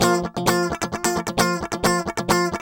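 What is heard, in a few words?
guitar, 130 BPM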